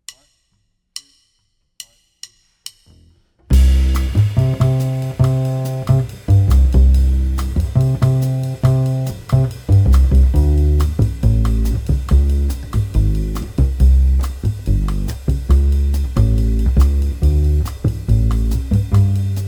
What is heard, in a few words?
Drums Bass; chords melody comping